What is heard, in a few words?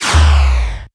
deactivated